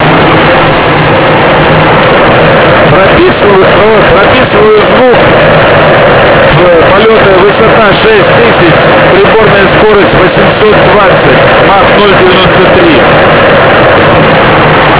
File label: wideband noise